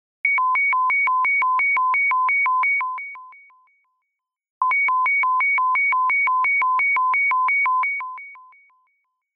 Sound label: Ring Tone